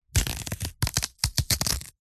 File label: horror gore small bone twist snap crack crunch